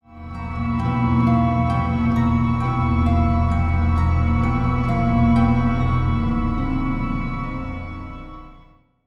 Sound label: Stinger; YShaarj